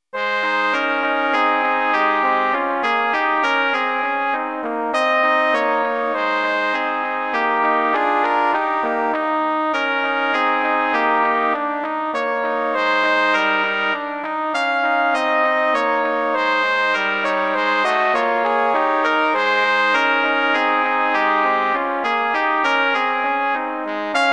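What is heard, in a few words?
Melodie Trumpet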